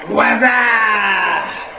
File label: short whaz